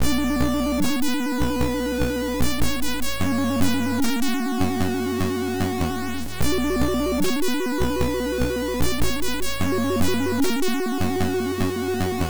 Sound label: vikings in-game; c64